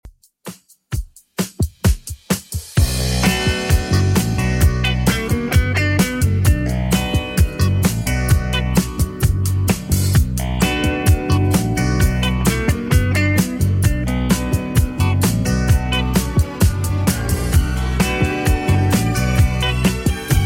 80s Redrum 130bpm CLEAN

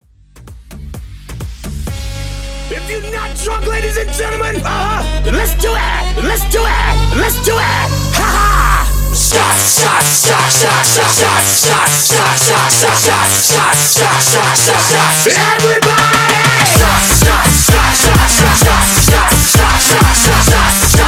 Tribal 129 BPM; Mashup

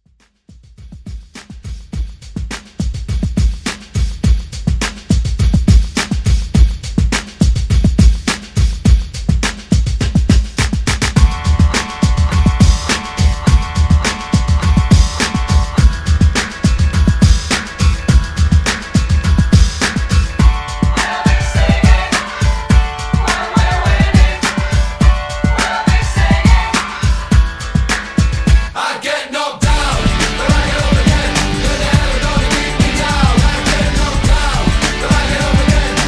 90s Pop Rock

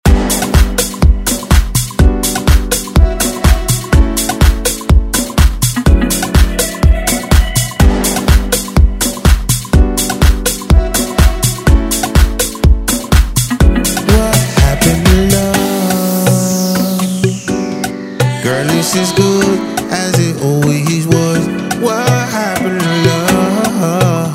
Afro Dance

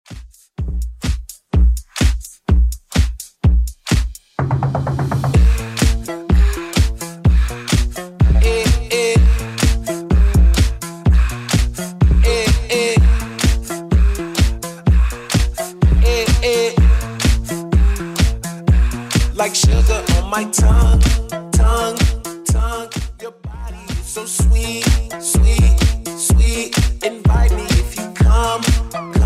Top40 Redrum 126bpm

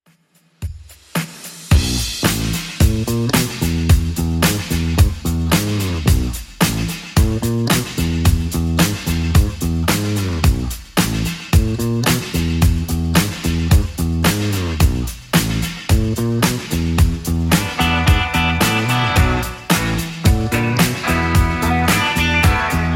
80s Club 110bpm CLEAN

